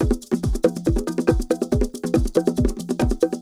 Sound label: Bongo Loop